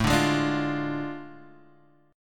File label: A Major 7th Suspended 4th Sharp 5th Guitar Drop D; chord